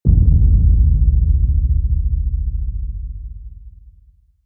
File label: Gentle Boom